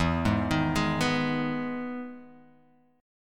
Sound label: E Major 7th E down Guitar Open G